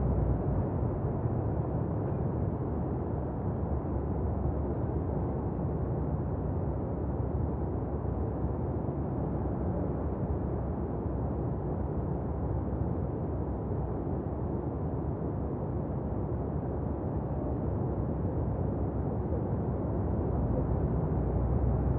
inside city, Ambient; zps

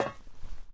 ladder, Footsteps; zps; Foley

